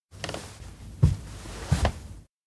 sneak; anemoi パッケージ版

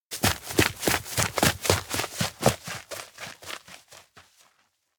steps outdoor grassland run away; makoto; anemoi

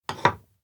desk place plate; anemoi

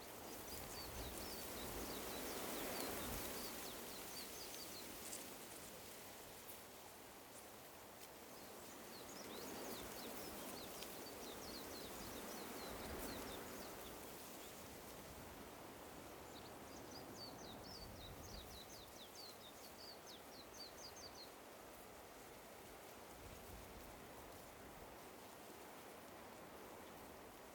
outdoor observatory

